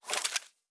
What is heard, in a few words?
Weapon Foley; Sci-Fi Sounds